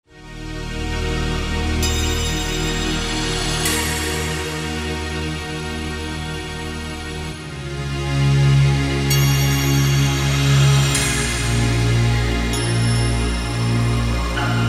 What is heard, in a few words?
Cyber Sequence